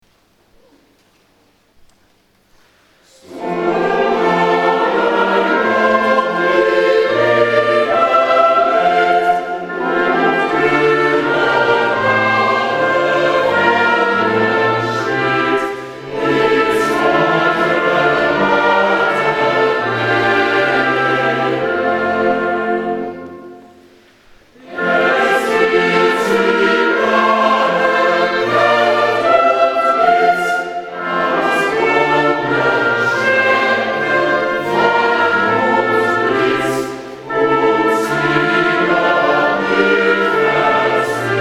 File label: Choral; BWV020 O Ewigkeit, du Donnerwort